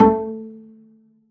pizz a3, double bass; dsmolken

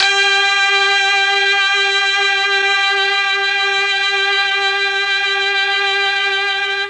G3 brass, mellotron MKII

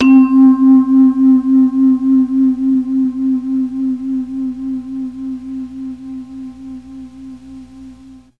C2 vibes, MKII mellotron

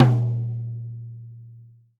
Tom Med; hydrogen drum samples

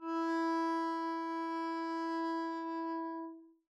arco e4 pp up, double bass; dsmolken

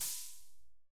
808 Cymbal; hydrogen drum samples

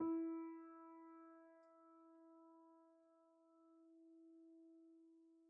GPiano sus E3, Grand Piano, Kawai; Chordophones; Sustains

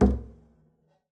pizz noise mute c, double bass; dsmolken; noises

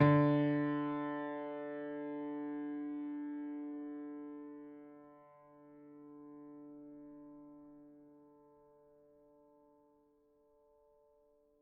GPiano sus D2, Grand Piano, Kawai; Chordophones; Sustains

